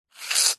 hospital syringe squirt gel medicine into plastic container